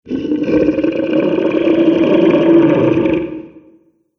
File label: Dinosaur Creature; animal; Dinosaur Sound Effects